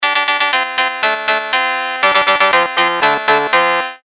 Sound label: asian oriental chinese japanese riff; Asian - Kung Fu